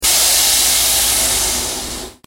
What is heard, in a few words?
stop